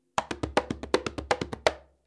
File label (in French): roulement ternaire, congas